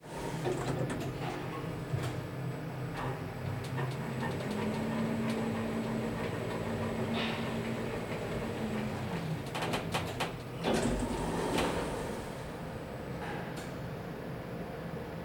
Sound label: Elevator ride